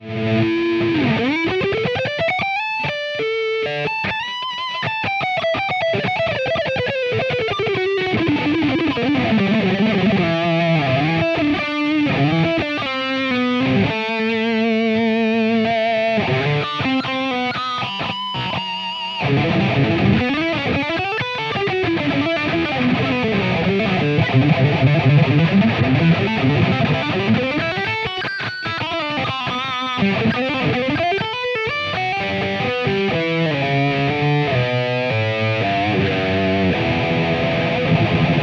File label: soldano stomp; podxt